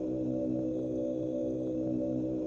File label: monks; Notes